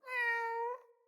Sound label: Kitten Meow Playful, Enemies; Catbrow